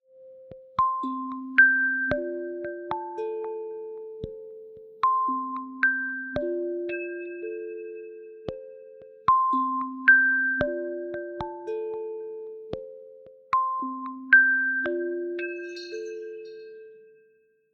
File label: Night Chimes; Samsung; Galaxy A56; ringtones; Calm